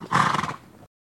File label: Horse Blow Generic